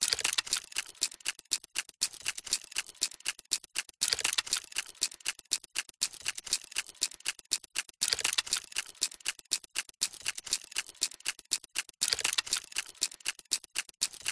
Complex Mechanical Loops Gears Movement 120BPM Steampunk Tick; PMSFX